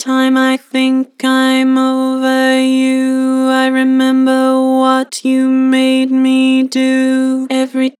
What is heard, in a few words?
Vocals Flat Every time I think; Harmor